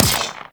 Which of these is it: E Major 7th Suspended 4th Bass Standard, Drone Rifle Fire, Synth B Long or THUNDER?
Drone Rifle Fire